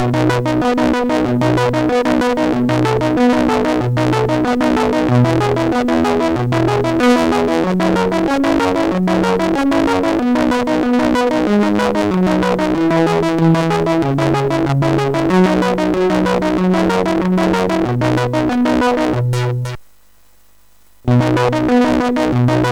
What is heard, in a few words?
Instrumental Compositions, sid MOS6581R4